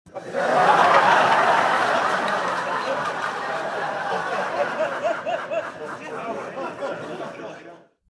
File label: circus laughing crowd